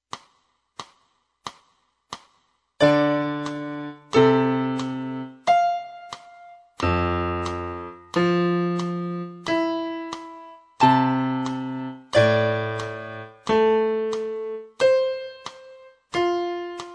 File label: piano